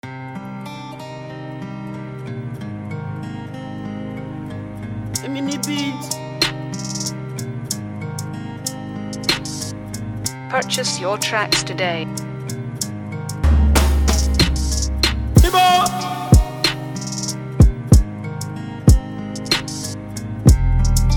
Trap

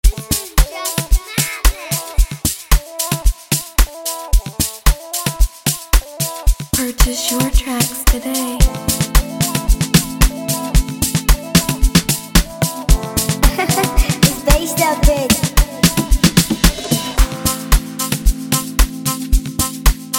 Afro street instrumental

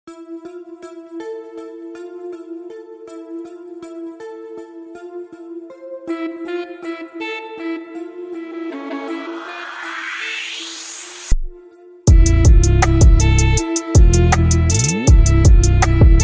Free Drone Beat

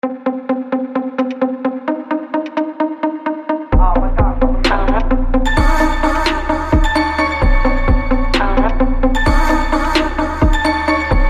South African RNB Trap Instrumental, Type Beat

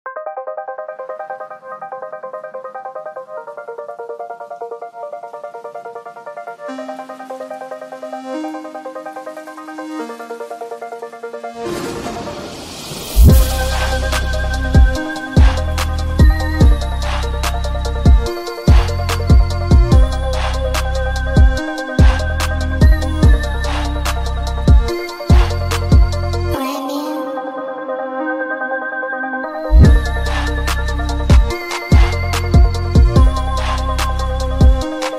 Hardvapour Beat

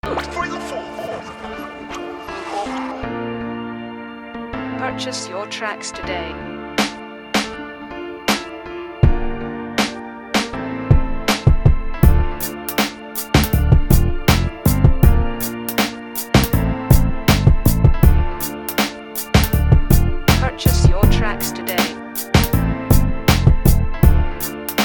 GANGSTER RAP INSTRUMENTAL

